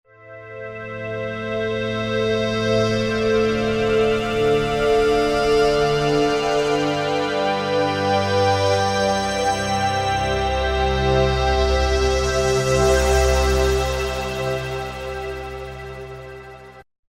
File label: D-50 Filterz, Roland V-Synth; Factory Patches